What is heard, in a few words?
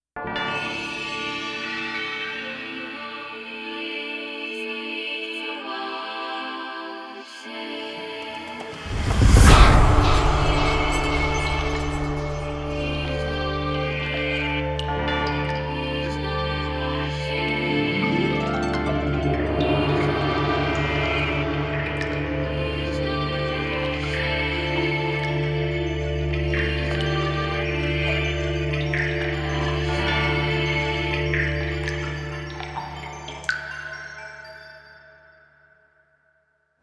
instrumental; Ar tonelico; EXA PICO